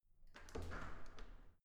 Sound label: entrance door open quick